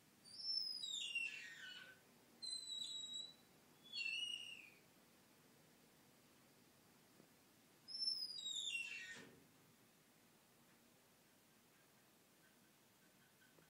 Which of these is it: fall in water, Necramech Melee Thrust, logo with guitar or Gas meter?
Gas meter